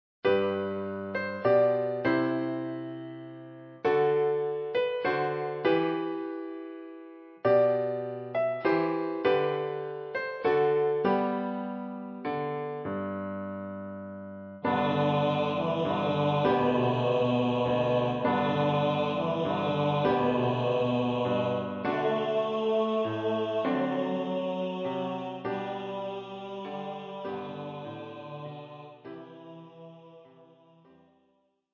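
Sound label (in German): Tiefe Stimme; Stille Nacht heilige Nacht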